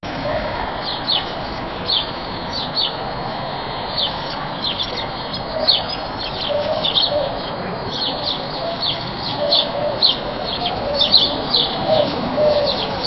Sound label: create an atmosphere of t, playground